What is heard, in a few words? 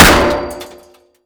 Projectile Sounds; Red Orchestra Ostfront 41-45